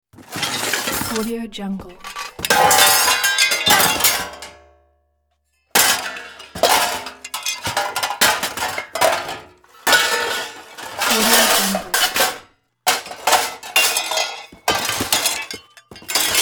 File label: junk put into box